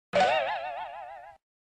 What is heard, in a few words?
silly springy pluck